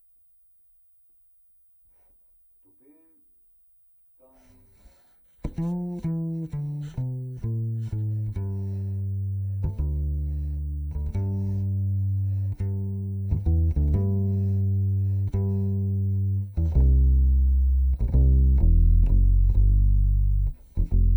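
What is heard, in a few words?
Contrabass DI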